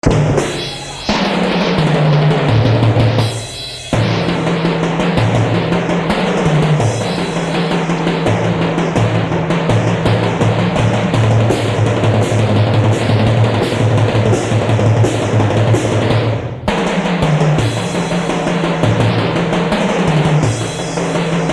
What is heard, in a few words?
drum fire; Acousmonuments